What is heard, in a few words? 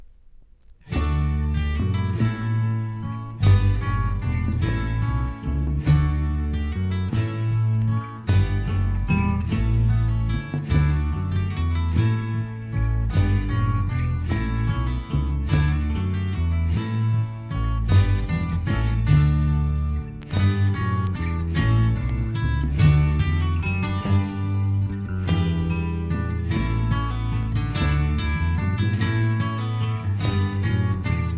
Instrumental